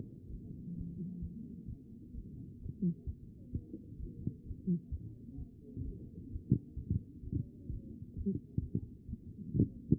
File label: A CHEST; B MODE